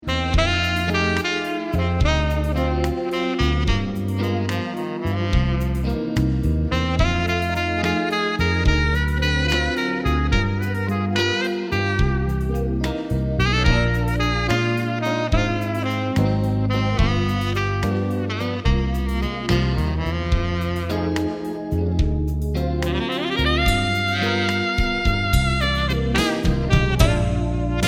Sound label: tenor; woodwind